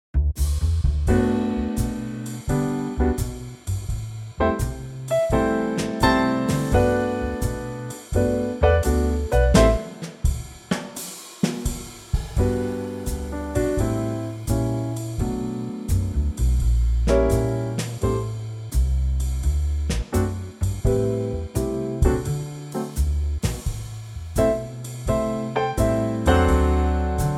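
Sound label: G trio, male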